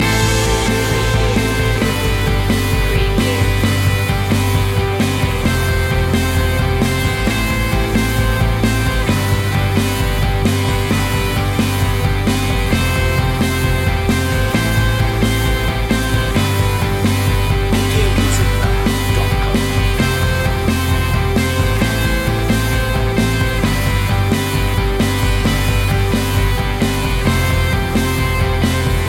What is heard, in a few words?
heartbeat loop